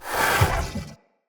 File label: Corrupted Battle Dog Breath Fill, Animals, Vocals